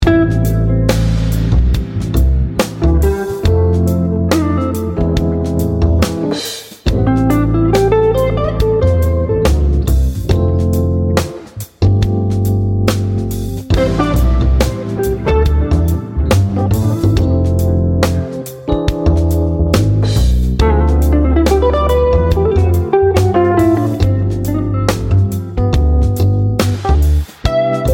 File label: Sunny Jazz Guitar